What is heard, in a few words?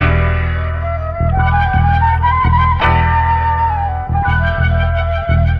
flute to the piano and bass; Loops